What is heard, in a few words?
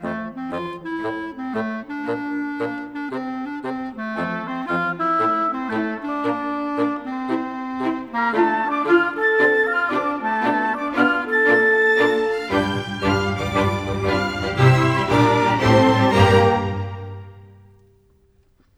Rock Pop, Orchestral; Sonic Foundry (Sony Creative Software); Orchestral Series VOL-4 - Rock and Pop